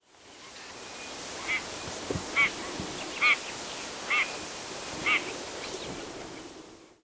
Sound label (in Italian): Averla piccola Giovane